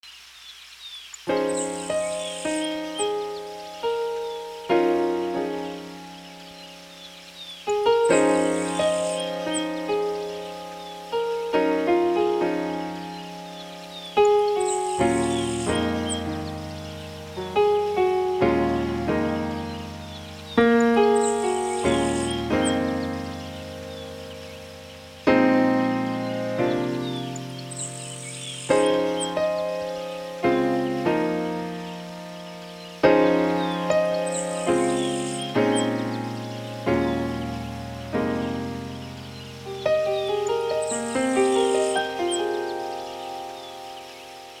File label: Piano Pastoral